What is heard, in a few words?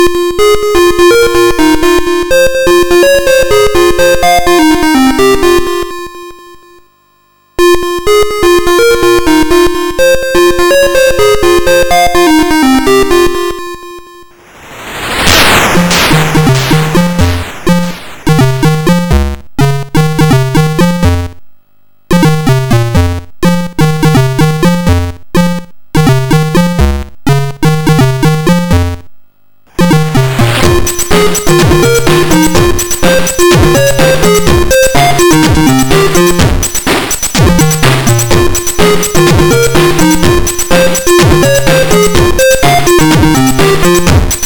Music Box; Overdrive